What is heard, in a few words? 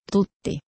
tutti